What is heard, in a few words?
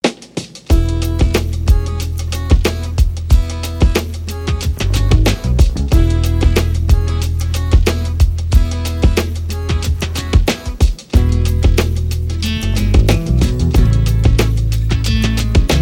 Hip Pop